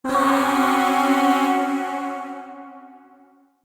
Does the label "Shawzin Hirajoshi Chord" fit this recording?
Yes